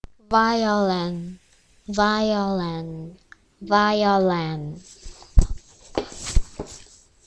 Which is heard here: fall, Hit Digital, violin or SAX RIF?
violin